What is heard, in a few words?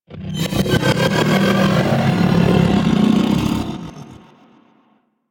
Arachnoid Camper Vocal Ability; Warframe; Corpus